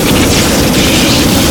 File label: flamethrower shoot crit; chicken fortress 2